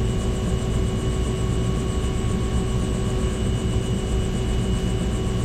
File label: mi35m engine inside engine spin start; War Thunder